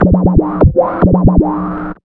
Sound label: 125 DRM, Fresh Disco House